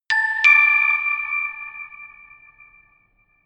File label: notification glockenspiel creepy fourth up